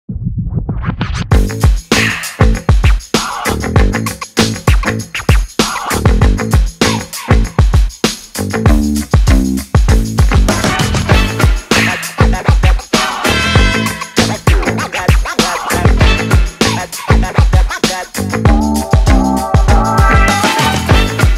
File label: Funky Hip Hop; Abstract Hip-Hop